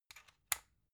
magnetic plug soft, elektronik; objekte

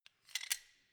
wrench close soft; schrauben drehen hydraulik

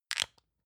lotion lid open medium; berufe; friseur

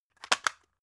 drug package drop hard; berufe; arzt

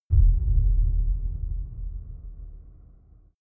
far outer space shadow, hits impacts

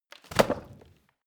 wood bing drop; berufe; foerster

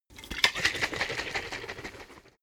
bottle vodka shake foam fast; berufe; gastronomie

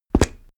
step stone walk

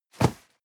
cardboard box medium place slide hard; objekte

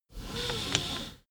car seatbelt release

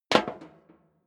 step leather shoes metal rattle single stair down; schritte

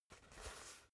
basketball spin turn in hands short quick; sport freizeit

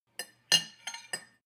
cutlery on plate clatter and scrape, gastronomie; soundpool

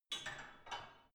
barbell only replace soft; sport freizeit; fitnessstudio

